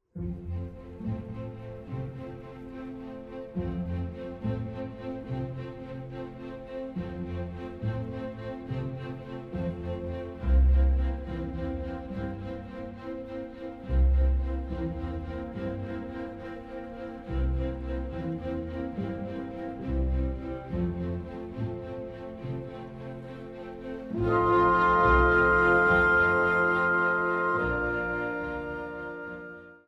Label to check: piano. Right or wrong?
Right